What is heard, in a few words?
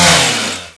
chainsaw attack end, weapons; hero